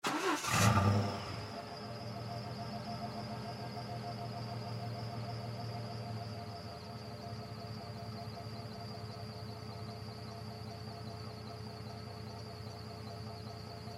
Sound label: Chevy Blazer 1996 Start Up Idle Rev Rev Off Exterior Near Exhaust Pipe; Transportation Automobile; Car